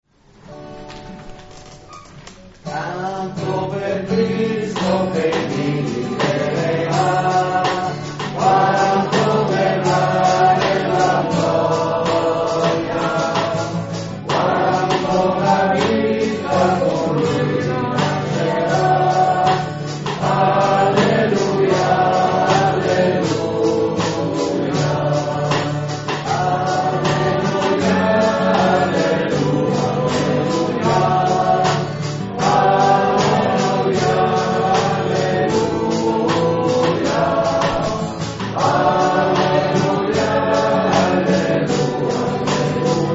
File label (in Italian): canto; messe